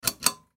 Light Pull String, Light Pull String Switch Closet; Household; Lights; Blastwave